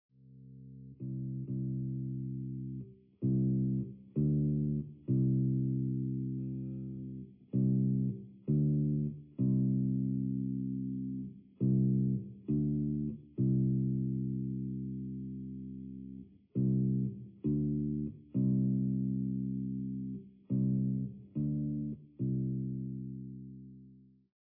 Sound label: a whole step